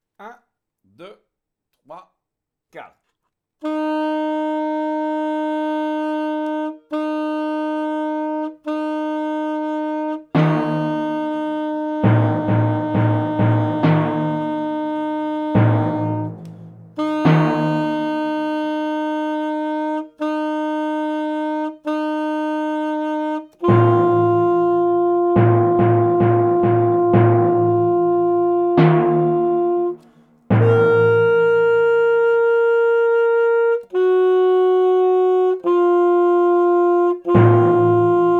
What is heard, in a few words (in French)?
marche, saxophone